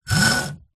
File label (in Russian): zvuki metallicheskogo trosa (kabelia)